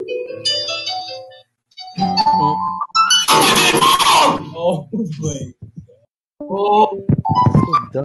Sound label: piano rage; enrique